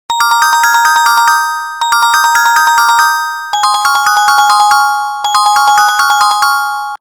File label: Melodic Bell; Sanyo E4100 Taho